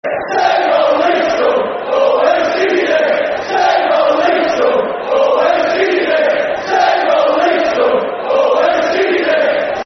Same Old Leeds Scum, Football Chant (Soccer Songs); Crewe Alexandra Cafc